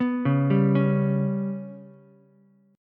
B Suspended 2nd Sharp 5th down Ukulele; arpeggiated; JGuitar

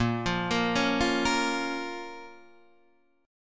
Bb Minor Bb down Guitar Standard, chord; arpeggiated; JGuitar

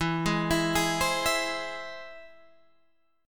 E Augmented, Guitar, Open G

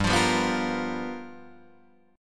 G 7th Sharp 9th G chord, Guitar Open D

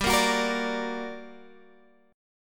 G Minor Major 7th, down, Guitar, Standard; GmM7 strummed; JGuitar

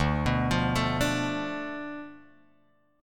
D Minor 6th Guitar Drop D

